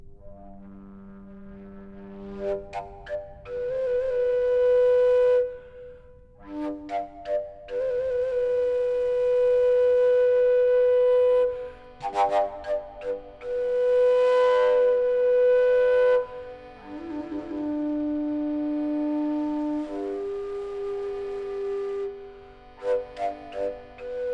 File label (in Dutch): klankontspanning fluit